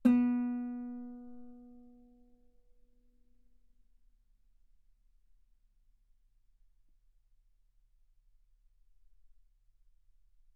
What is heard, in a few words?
Guitar ff B3; sul B